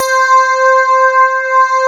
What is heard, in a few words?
CLAV, FUNK FM CLAV